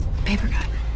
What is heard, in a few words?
papercut sms; ringtones